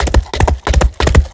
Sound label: running; lighthouse pluton; lighthousemonster